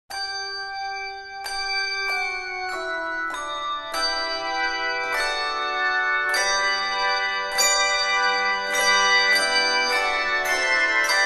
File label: Bless That Name (Handbell Choir); Pillsbury Baptist Bible College